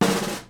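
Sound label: SNARE ROLL, Live Snares; PlatinumPhattCD1; E-MU Producer Series Vol. 8 – Platinum Phatt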